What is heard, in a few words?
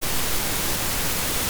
noise; generator suma